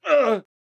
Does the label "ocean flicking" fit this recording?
No